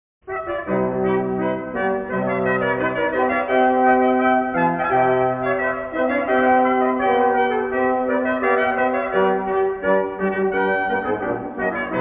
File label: Regal Brass